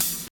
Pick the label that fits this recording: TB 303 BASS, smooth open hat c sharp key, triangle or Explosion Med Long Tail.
smooth open hat c sharp key